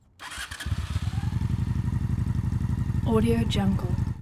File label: honda shadow turn on idle; 2001; soundmorph